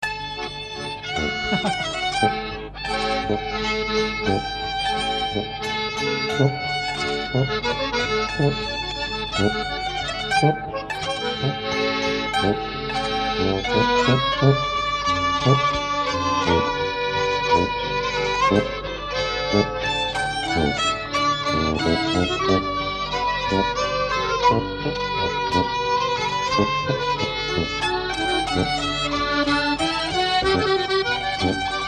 VALZER DI MODENA

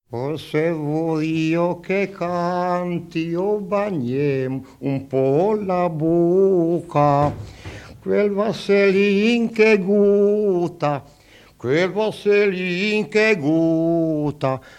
o se voio un po' che canti; brianza

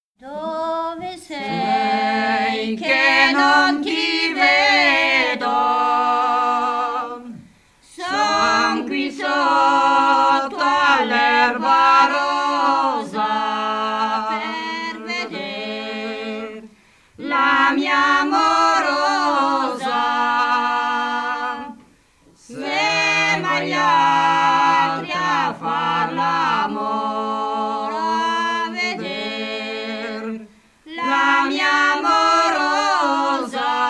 dove sei che non ti vedo (coro); brianza

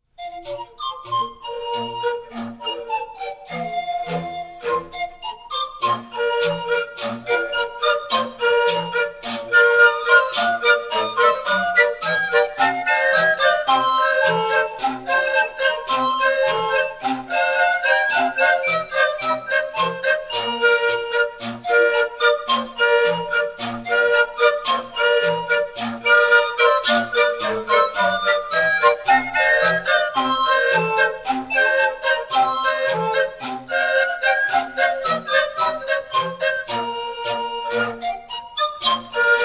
firlinfeu; brianza

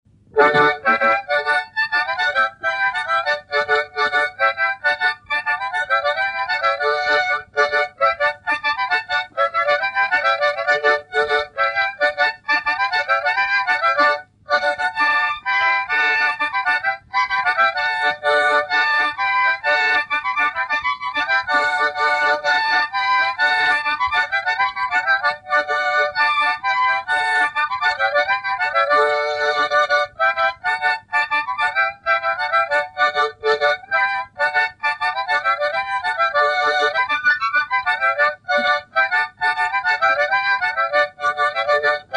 giga (armonica)

